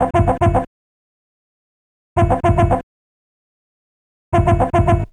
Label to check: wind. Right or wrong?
Wrong